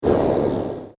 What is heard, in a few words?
Deep Sf Wave Element; Laser Sound Sound Effects; science fiction sound effect; star wars / trek style games